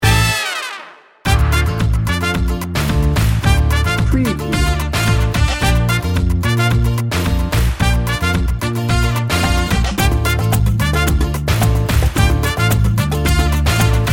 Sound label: latin salsa merengue